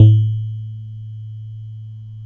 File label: GLASSY A2, BASSES; Best Service Alpha Dance vol.2